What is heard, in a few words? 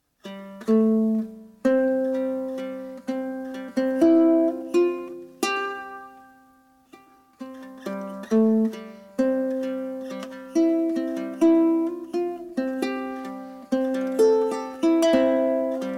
ukulele